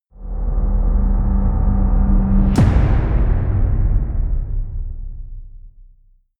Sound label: heavy drums long